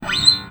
FRET, FINGER MUTE; Xsample VOL-1

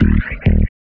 Zappy Bumpers, Fx; Sonic Foundry (Sony Creative Software); Esoterik Beatz

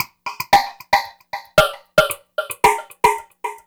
Liquid Grooves; Spectrasonics; 113 LIQUITEK

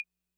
Clava, 808

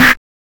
Snare, Drum and Bass

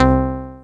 chord one shot plucky C, Classic Chicago House; Sample Magic; Chord Shots

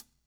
COPS STK; Spectrasonics - Backbeat

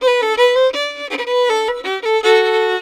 VIOLIN, Celtic

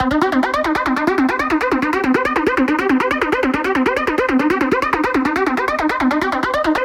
140BPM C; Vengeance - Essential Clubsounds Vol.4

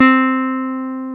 Jazz Guitar

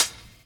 DR. LOOP; X-Static Goldmine 3

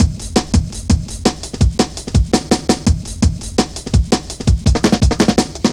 Drum Loops; Zero-G Jungle Warfare 1, 2 & 3

